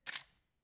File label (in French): son clic